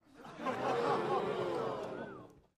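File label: Crowd 100 Laugh Reaction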